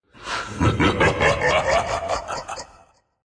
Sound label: laugh